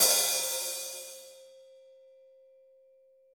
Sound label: HH, Drums; Bob Clearmountain Drums I